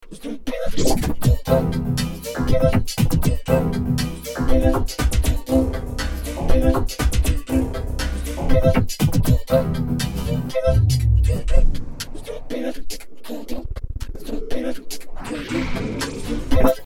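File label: Phrase, A Patch that mixes the elements of New Wave and Electronica.; V-Synth; Sketchvoice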